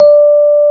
D 4, Electric Pianos; Wizoo Electric Pianos

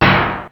Snare, Drum Machines; Ensoniq ASR-X Pro; 300 Drum Machines

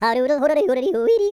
YODEL; ILIO; Vocal Planet

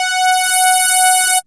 SWEEP F#4; Best Service Alpha Dance vol.2

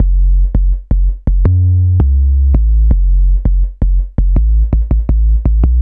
165bpm Dm, Bassloops; Drum Bass; Fatbutt; Zero-G; Total Drum Bass

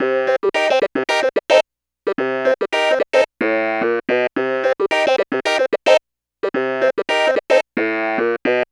Funky Clav; Keys; Dr. Fink's Funk Factory

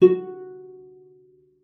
cello pizz F#4; 90 s Sample CDs; Xsample VOL-5

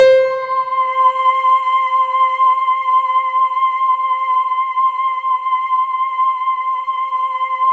C5, SynthLine; 90 s Sample CDs; NiceBeats; SynthLine VOL-22

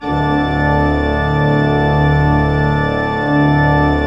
ORG, CHURCH ORGAN; Roland - LA Composer vol. 1

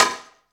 Side, Drum Machines; 300 Drum Machines; E-MU Orbit-3

